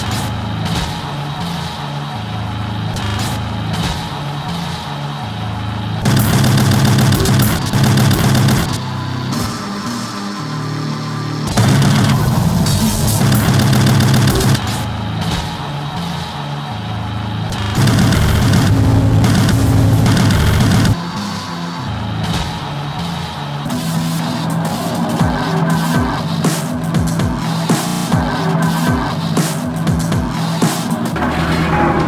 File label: loop; svencoop